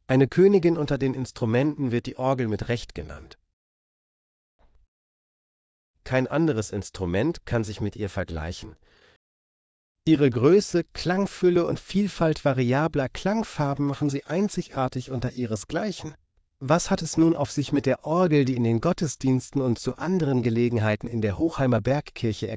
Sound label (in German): Bergkirche Orgel; Hochheim; worms weinberge